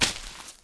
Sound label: Foot Forest; Civ4 warlords